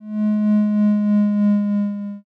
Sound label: boo